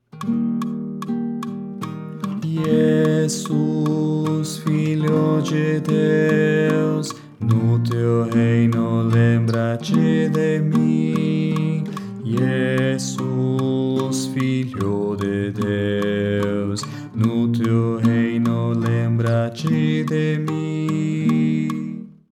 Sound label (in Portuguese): Jesus no Teu Reino Baixo